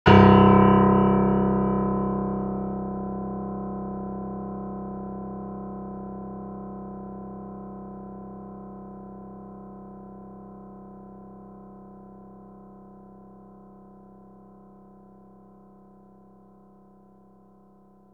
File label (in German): piano tonlaenge max